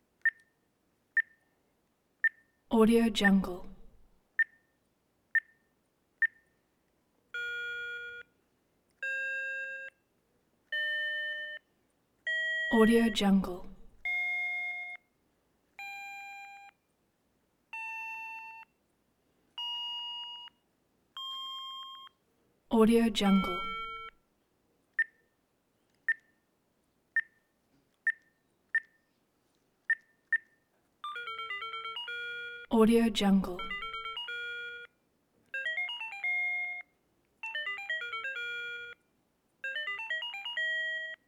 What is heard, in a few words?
casio calculator small beeps; prosoundfx